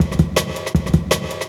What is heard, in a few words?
Cavern, Jungle Breaks; Original Jungle Breaks